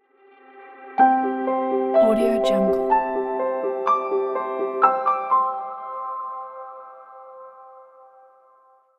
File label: summer piano logo